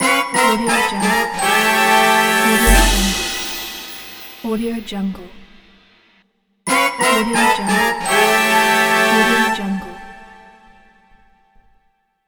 bad luck

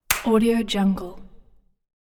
slap

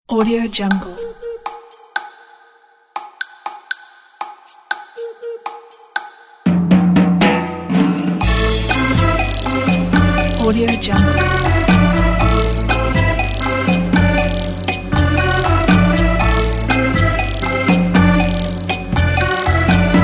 theatre organ melodies

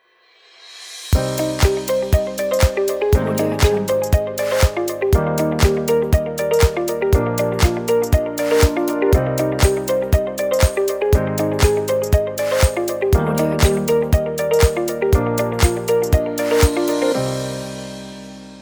positive corporate intro ident

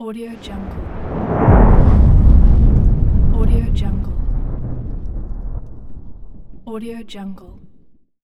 shock wave

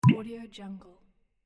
water drop

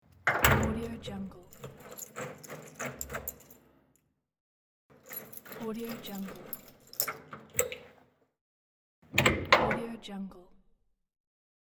opening the door lock

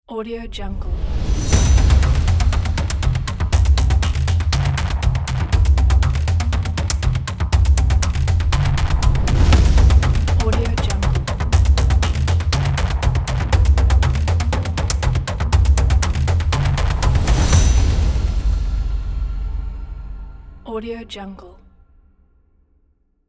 fast tribal logo

